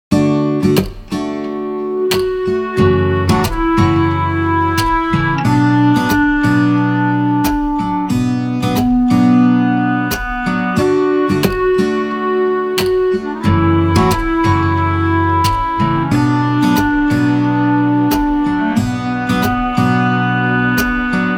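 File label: 90 bpm, folk music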